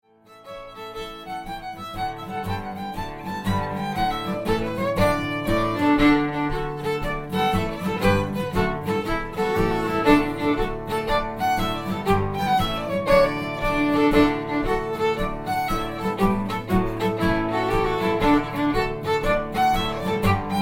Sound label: jig Smash the Windows; Irish/New England jig